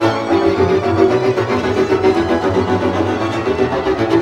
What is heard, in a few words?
VIOLA BREAKS; Best Service; World Colours